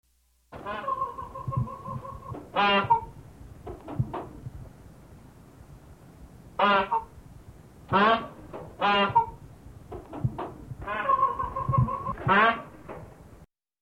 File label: Peacock clucking